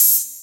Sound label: HH, HIHAT; 90 s Sample CDs; Best Service Dance Mega Drums